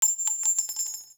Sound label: Exalted Sniper Shell Casing; Warframe; Frumentarius